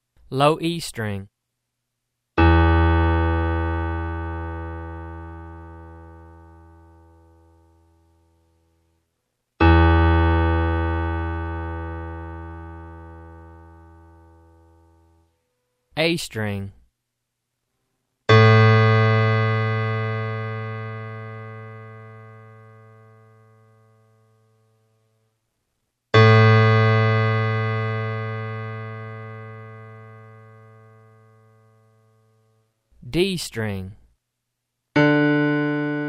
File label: Match Pitch Piano